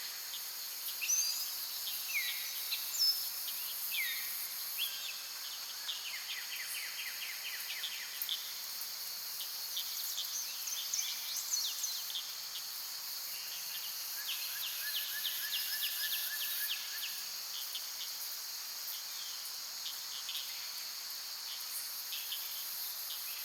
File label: day, nature; draugnorak